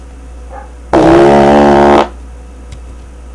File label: FART